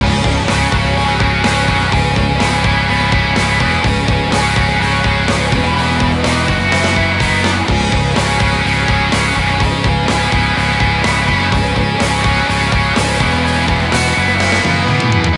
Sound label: game music; CrazyDriver; Action Games